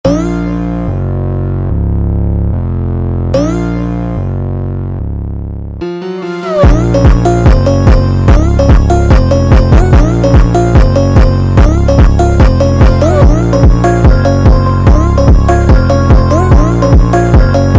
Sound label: instrumental Speed Up